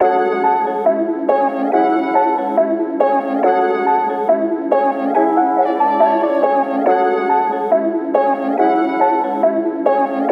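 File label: Trap Synth Melody Loop 140BPM C minor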